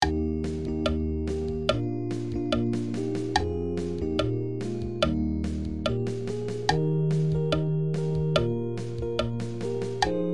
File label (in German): halleluja bariton slow; paulusfest